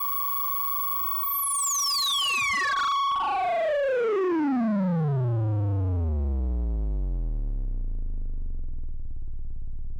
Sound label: Analogue Synth FM, Scanning Digital Data, Descent, Drop, Pitch; 344 Audio; Data Processing